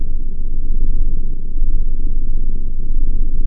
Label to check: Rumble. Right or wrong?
Right